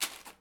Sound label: snack drop; Escape from Tarkov